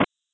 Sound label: impact; Mini Militia